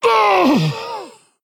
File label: Male Orc Death